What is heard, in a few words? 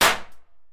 pistol; shooter